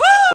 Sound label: woo female